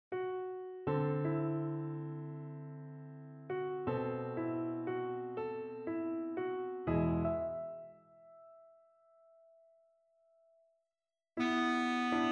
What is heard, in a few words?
Tenor II